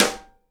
SIDE STICK, Live Snares

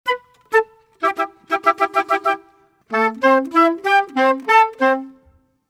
95 e min woodwinds, Orchestral arrangements

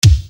Bass Dr, EuroDance Bdrums

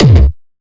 bass kick

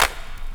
KIT, Dance Mega Drums; Best Service

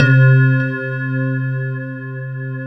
WAVEOLA C3; Big Fish Audio; Synth City; OLAKEYS

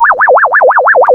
A#, FX; Big Fish Audio

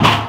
LUNCHBOX, PERCUSSIVE; Rarefaction; Poke in The Ear With A Sharp Stick

